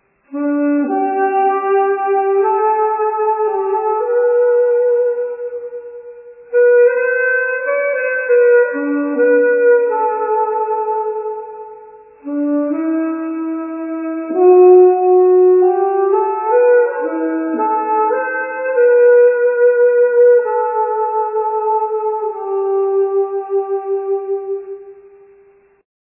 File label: Saxophone ringtones; 18th century; Plaisir D'amour